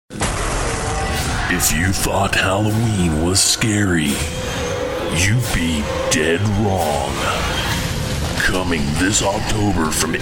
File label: Horror Trailer